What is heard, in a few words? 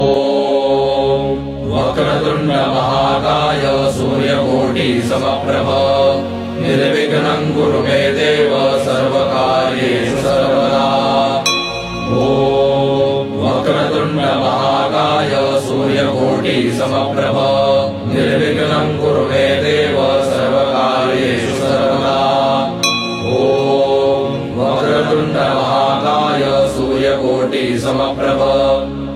Vakratunda Mahakaaya Ganesh Mantra